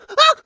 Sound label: lava vocal; Scrat